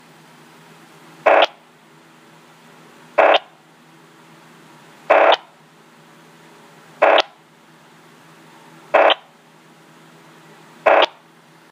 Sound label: MotoTRBO Rest; MotoTRBO CapacityPlus 'Resting Burst'; MotoTRBO CapacityPlus trunking systems broadcast this every 2 seconds to keep subscriber radios updated & synced to the system.